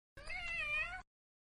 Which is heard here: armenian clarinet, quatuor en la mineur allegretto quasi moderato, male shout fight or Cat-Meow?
Cat-Meow